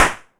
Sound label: Clap n Snap, Snares